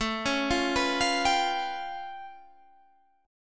A Augmented 9th A, Guitar Standard; arpeggiated; 17,16,15,16,18,15; JGuitar

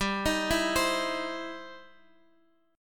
E Minor Major 7th Sharp 5th G

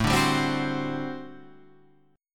G Major 7th Suspended 2nd Suspended 4th A

